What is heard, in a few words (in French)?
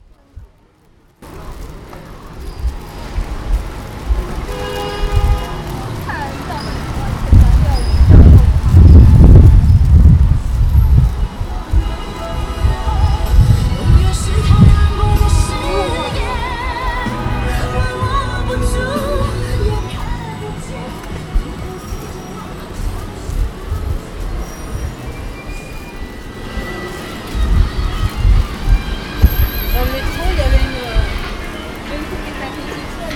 Chine Beijing En marchant dans la rue